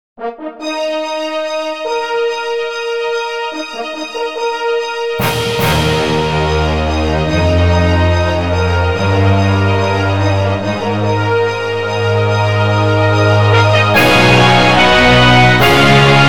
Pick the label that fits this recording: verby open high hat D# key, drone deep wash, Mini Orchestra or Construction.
Mini Orchestra